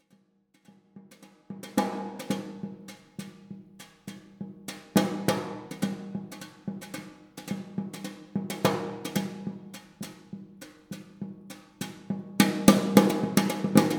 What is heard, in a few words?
Waltz